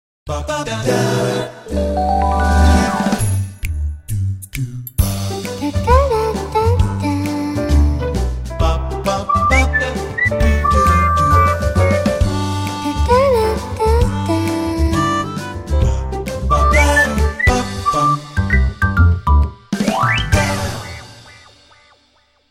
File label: vivo y95 relax ringtone